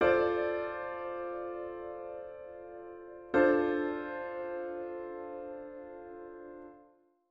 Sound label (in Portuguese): Acorde com sexta ambiguidade; la Motte